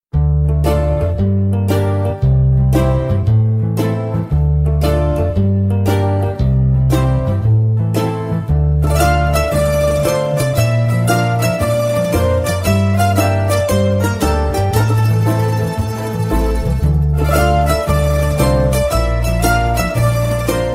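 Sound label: Music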